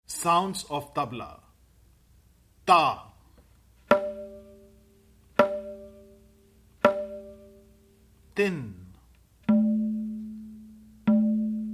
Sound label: Tabla sounds